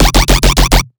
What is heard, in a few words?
Riddim Bass Hit F; One-Shots; Bass Hits; Quack; Ghosthack; Riddim Essentials Volume 2